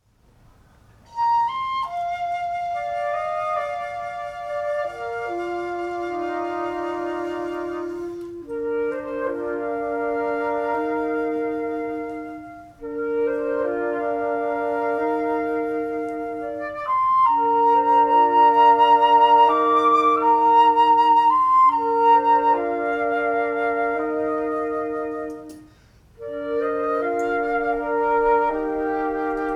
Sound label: Flutes Of Nature Snow